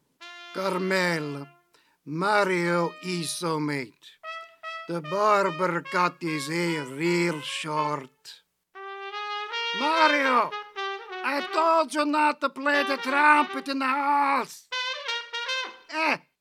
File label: Trumpet